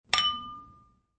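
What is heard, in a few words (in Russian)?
звон бокалов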